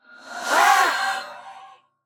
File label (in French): Banshee Cri; Phasmophobia